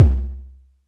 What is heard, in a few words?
Tom Low